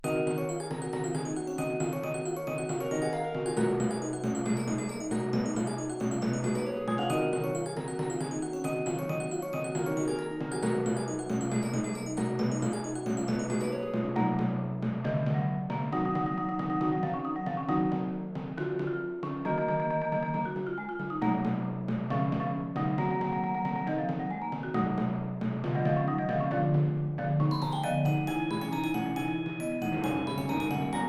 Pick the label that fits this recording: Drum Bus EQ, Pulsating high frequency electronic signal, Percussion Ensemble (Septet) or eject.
Percussion Ensemble (Septet)